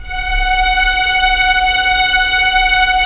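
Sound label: Strings Violins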